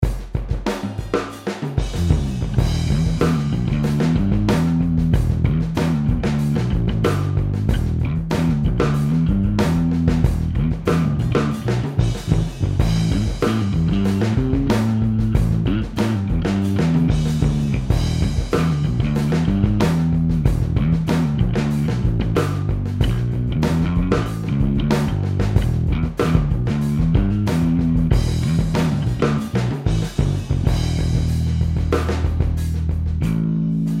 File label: Blues